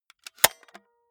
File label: walkman close medium